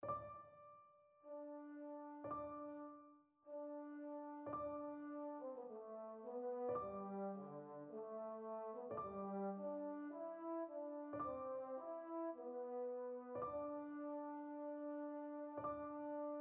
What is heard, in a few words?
steppes, euphonium